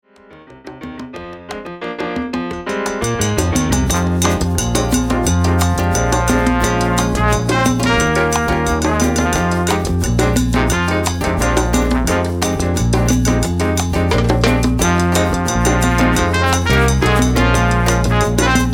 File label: sextet instrumental